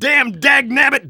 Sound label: Engineer negative vocalization